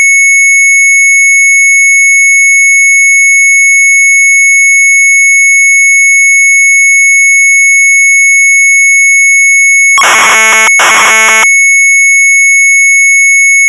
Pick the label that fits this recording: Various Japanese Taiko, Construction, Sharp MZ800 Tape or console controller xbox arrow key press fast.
Sharp MZ800 Tape